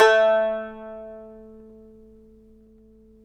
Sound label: TAR; Roland - LA Composer vol. 3